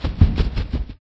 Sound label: run; Five Nights at Ronald's